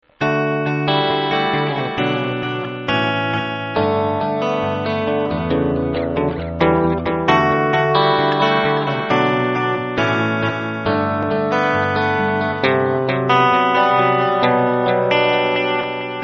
arp delay

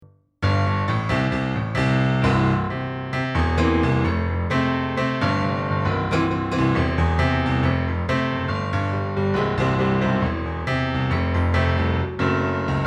Turnaround Jam

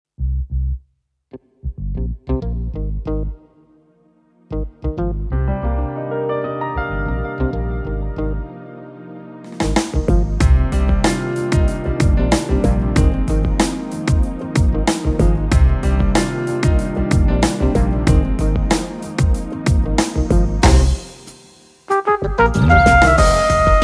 Trumpets

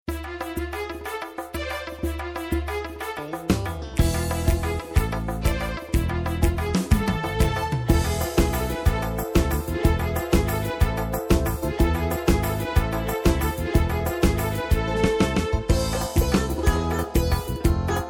Iranian; OR700 Sound Showcase

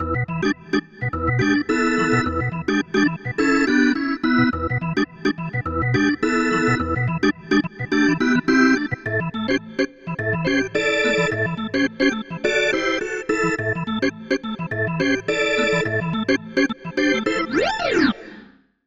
Dual Organ

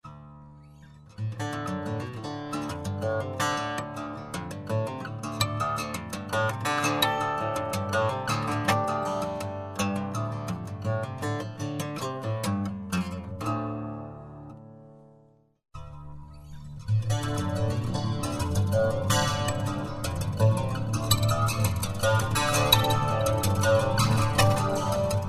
Guitar Steel String

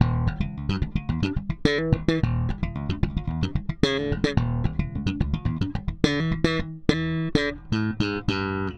Slap Bass LA2A

